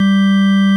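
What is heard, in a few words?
ORG Vox G, Continental organ; Keyboards of The 60's and 70's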